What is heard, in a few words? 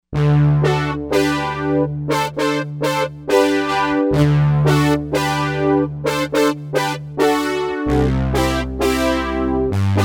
Poly Brass